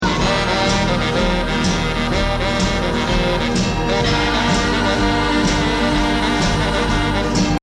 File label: sax; xmas